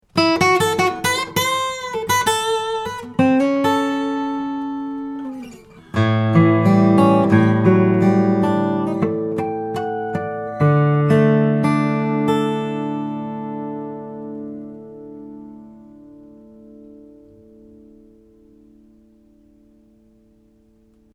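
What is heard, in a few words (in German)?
U 87 guitar Kugel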